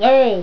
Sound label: Yay